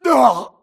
grunt death quick; Titanfall 2

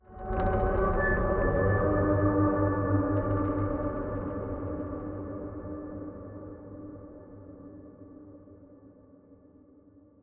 Sideways Town Metal Creak; Boomtown; Titanfall 2

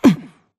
grunt hits; Titanfall 2

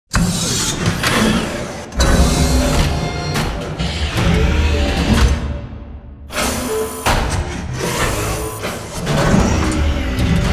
Assembly Furniture Arm Close; Boomtown; Titanfall 2

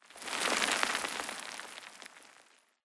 Rock Debris Small Short; Boomtown; Titanfall 2

